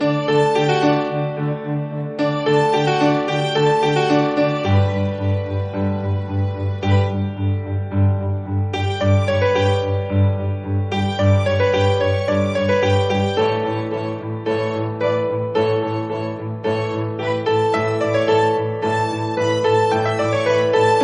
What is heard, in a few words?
ringtone